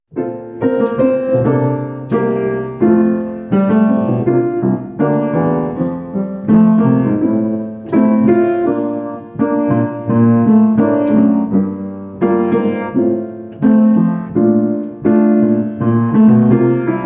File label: accompaniment